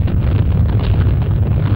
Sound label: Spaceship Rumble Loop; SFX Kit; Sci-Fi Loops